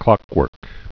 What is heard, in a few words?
clockwork